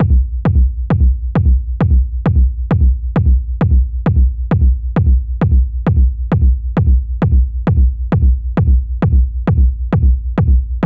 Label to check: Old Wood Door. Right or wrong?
Wrong